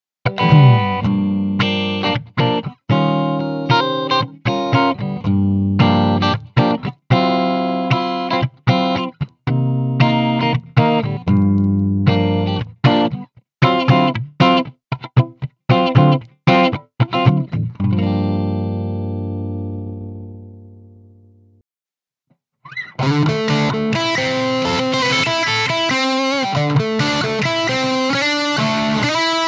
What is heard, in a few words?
Electric; NEVE